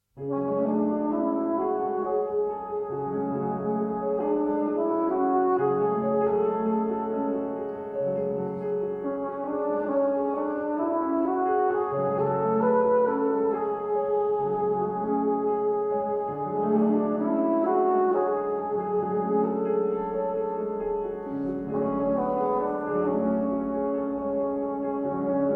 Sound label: Flugerlborn & Piano; Steve Reich; Classical; Avant-Garde